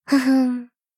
laugh

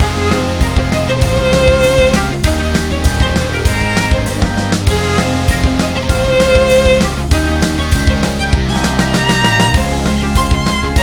bgm battle cross the line elegant

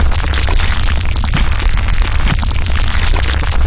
magma

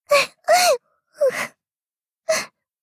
cry

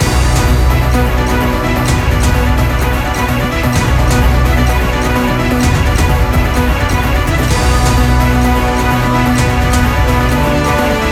bgm battle siren; centraltower

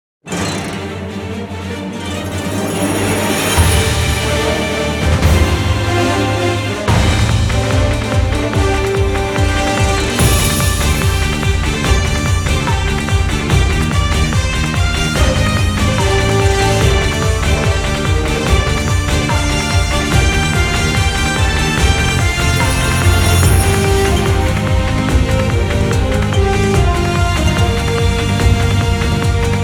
bgm theme marching; musashi

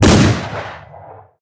battle cannon sub

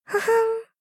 laugh

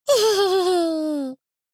laugh

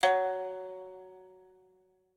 pipa

